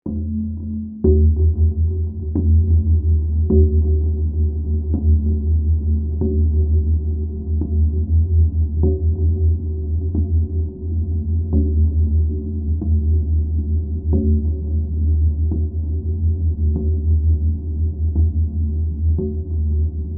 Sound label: Drone Musical; Drones; Sound Design